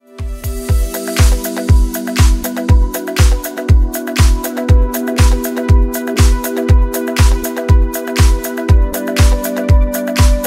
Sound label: french club ringtone